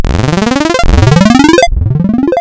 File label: Formants Non Sine, wavetables; Architecture 32